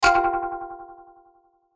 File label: Hit Percussive Slap Warble; Blastwave